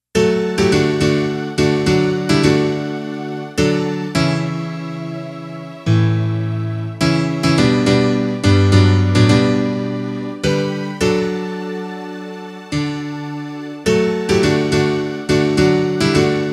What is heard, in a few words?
instrumental